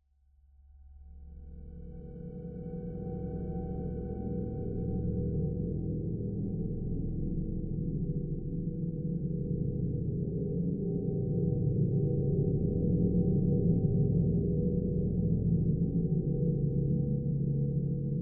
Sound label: Ghostly Presence, Angelic, Vocal, Layer, Creepy, Horror; 344 Audio; Supernatural Ghosts